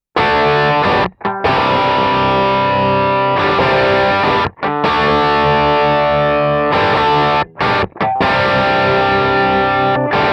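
crunch, Guitar Dry Sound – Guitar Wet Sound – Guitar + Band; USA ’80 Crunch (Mic Pre Amp Tube Bruco); From 0″ Guitar Dry Sound – From 14″ Guitar Wet Sound – From 27″ Guitar + Band; Kemper Profile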